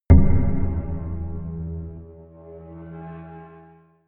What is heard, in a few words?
melodic wooden pipe hit, impacts; hits explosionen